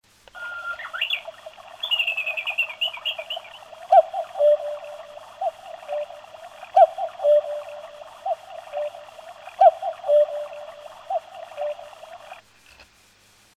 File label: cuckoo; hatodokei